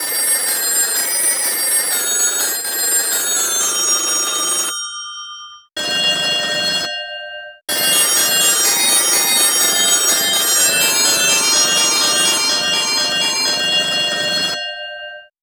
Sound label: telephone